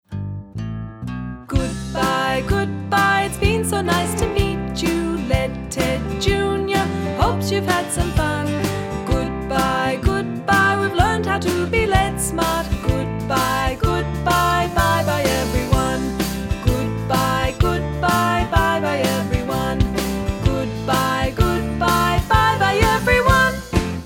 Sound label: goodbye song